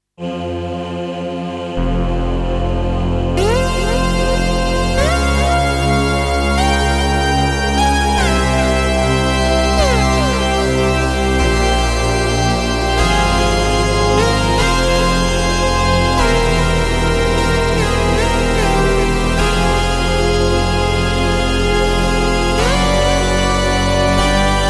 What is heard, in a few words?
witches prelude